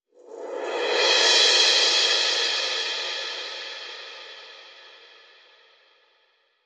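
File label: Whoosh Cymbal Roll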